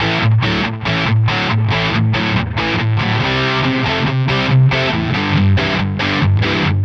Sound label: Distorted Guitar 140BPM Amaj; Vengeance - Essential Clubsounds Vol.4